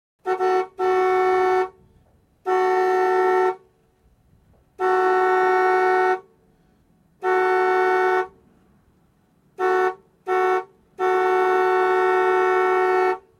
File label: Car Horn Honks Medium-Pitched; Cars